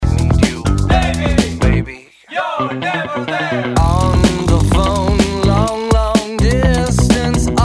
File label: vibra cake